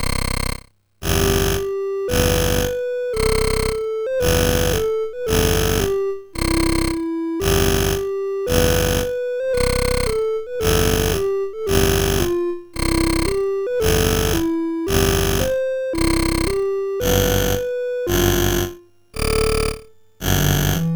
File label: Scarabaeus, MOS6581R4